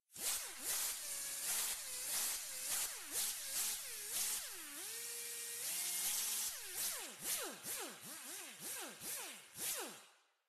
sound werkstatt; minecraft

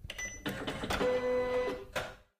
kopierer; minecraft

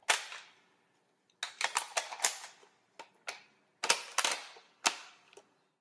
waffen laden; minecraft

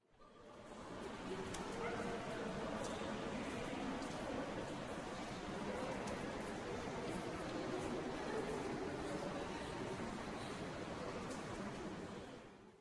rsound bahnhofshalle; minecraft

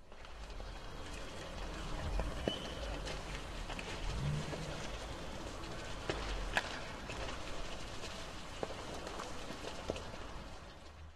rsound brennendes haus; minecraft

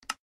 klick; minecraft

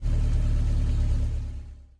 auto motor; minecraft

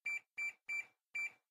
kauf eintippen; minecraft

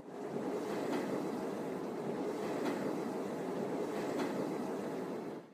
sbahn fahrt; minecraft

